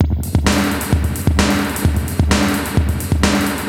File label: Spring Verb HR-16B (130BPM), cyborg beats